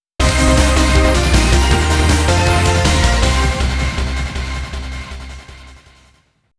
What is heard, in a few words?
Mission Failed; gunblade ny l.a. machineguns